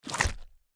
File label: icon fallen softer; Fruity Burst 2